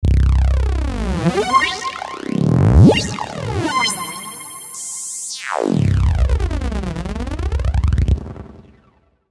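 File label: Control Squeak, V-Synth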